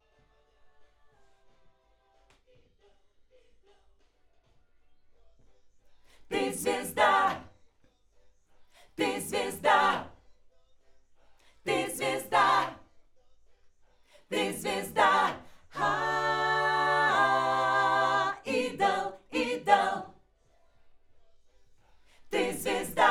Schoeps AKG, piano

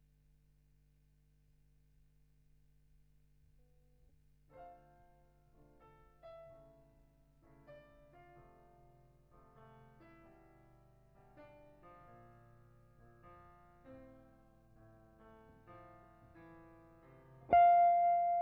GTR B

